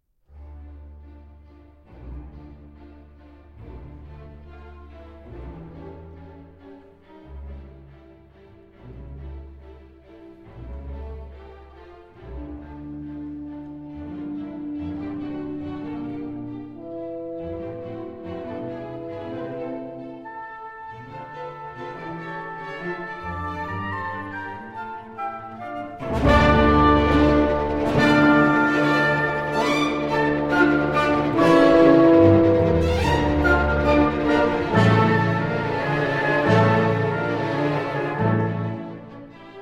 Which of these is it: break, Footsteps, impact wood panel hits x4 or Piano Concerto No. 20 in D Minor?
Piano Concerto No. 20 in D Minor